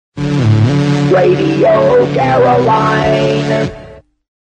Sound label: synth, Jingles; Radio Caroline